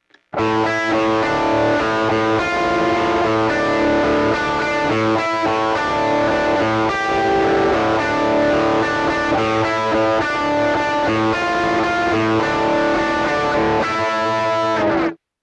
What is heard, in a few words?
classic stack; cube 60